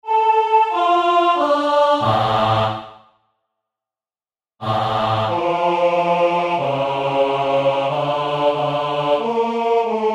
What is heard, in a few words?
Andante Bass; Salve Regina